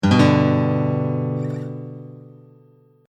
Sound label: Acoustic Gtr; chorducopia